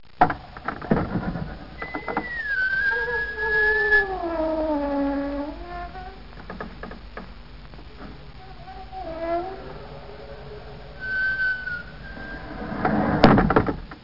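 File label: squeak door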